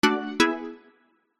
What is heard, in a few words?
multimedia game sound advance award nylon plucked synth